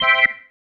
Stab One Shots, Organ

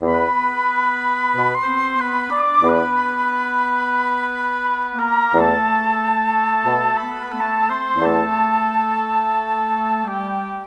Cinematic Winds; Sonic Foundry (Sony Creative Software); Orchestral Series VOL-3 - Cinematic

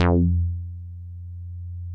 MOOG1 BASS F2

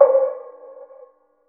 crash R; Zero-G; Chemical Beats; CRASH ZOO

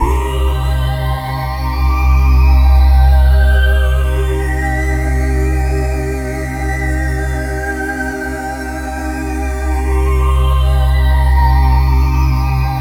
MIN, Synths

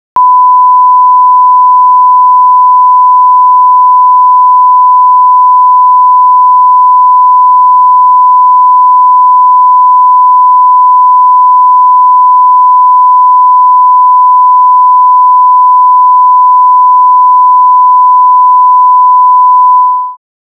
1kHz test tone at maximum level; Now!.That's What I Call Sampling! (1992)

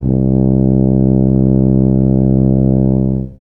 tuba C1; Best Service ProSamples vol.51; Classic Orchestra 2

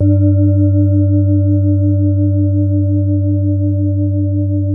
BOWL, Tibetan Bowls

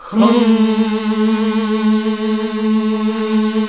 HMM A1, CLASSIC VOX8

